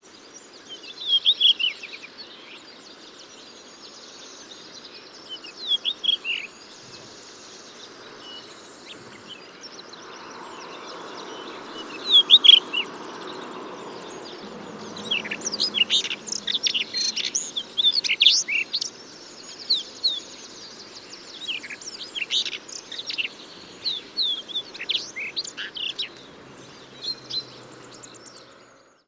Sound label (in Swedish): blatrast